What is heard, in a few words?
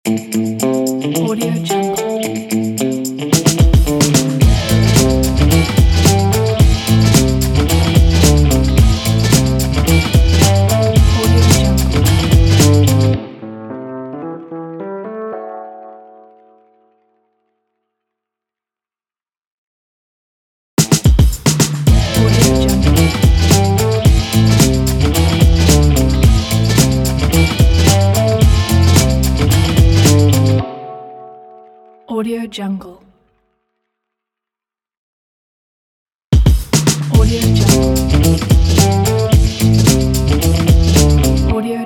vintage hiphop ident; honeyloud